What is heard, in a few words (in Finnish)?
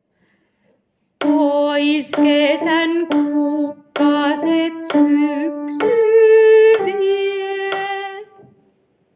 Syyslaulu, sopraano